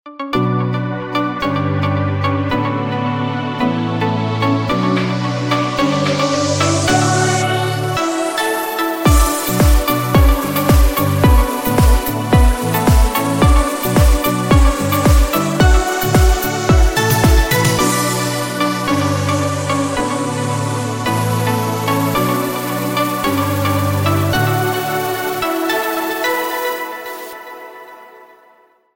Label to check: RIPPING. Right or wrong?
Wrong